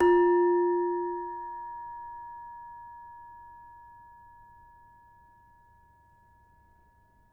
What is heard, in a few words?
Demung soft, gamelan; gravityharps; timbrebox